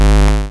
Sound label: bounce; sigurdrshavoc